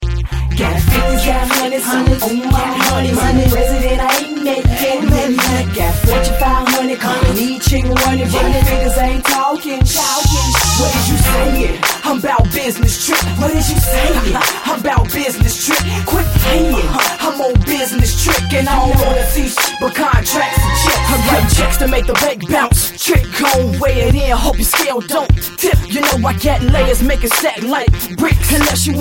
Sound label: business rap